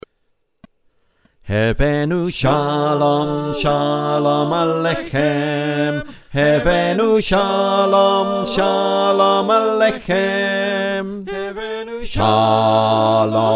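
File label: bass, choir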